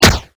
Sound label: 38 Pistol